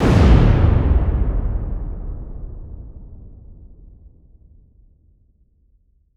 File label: Explosion Demolition Large Deep Powerful Blast Dynamite; Fire and Explosions